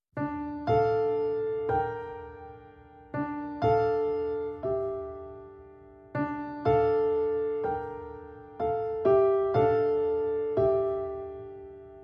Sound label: Piano Intro